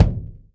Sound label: Hi Kick; Kick Snare Layers; Drum Bits; layering